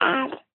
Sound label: baby boy ahpla; nova sound